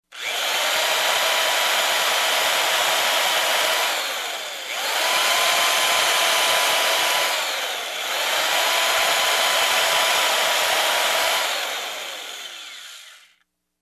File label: Drilling Up-close